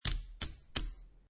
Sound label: Poker Chips Counting Chips; Gambling; Casinos